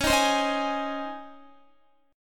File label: Db Major 7th Suspended 2nd Db Guitar; x,16,15,17,15,16; 4F,4A,5C,5G,5C,6E; strummed; chord shape